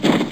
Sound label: hurt; raydium